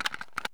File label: saiga magout tactical switch; Escape from Tarkov